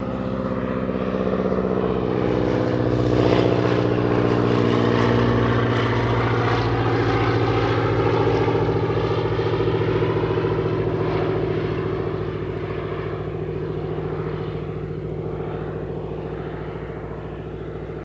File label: Prop Plane DHC, de Havilland Canada, Slow By And Long Out